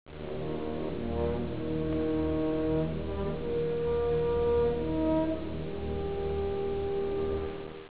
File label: nature